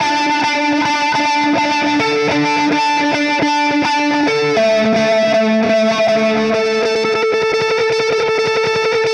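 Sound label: Delay Wah, Guitar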